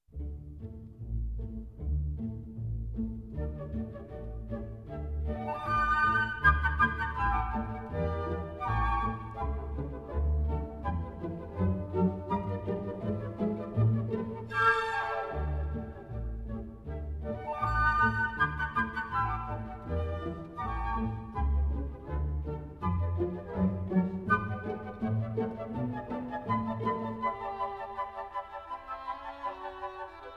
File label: Dance of the Reed Flutes